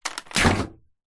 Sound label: household fridge door open; zapsplat